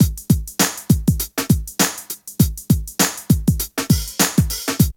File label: break; Zero G - Man Machine